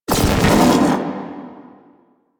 Interrupter Shield Activate; Warframe; Corpus

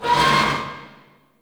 Destruction Resonant Metal; Ambience; Warframe; Corpus Railjack

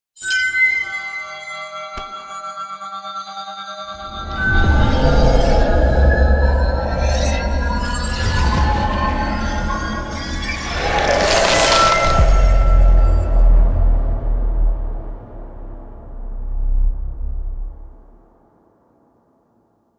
Gem Crystal; Warframe; Quests; The New War

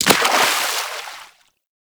Melee Impact Water; Warframe; Weapons

